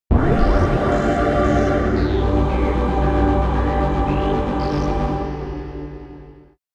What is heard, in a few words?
System Jingle; Metroid Prime Trilogy; Wii